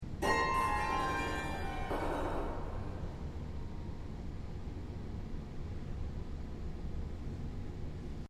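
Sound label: Piano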